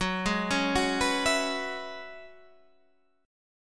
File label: F sharp 7th Suspended 2nd Suspended 4th, down; F#7sus2sus4 arpeggiated